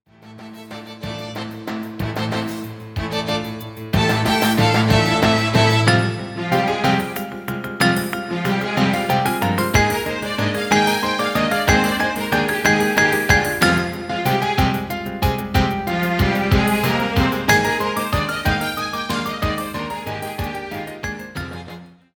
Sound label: Grand allegro, Ballet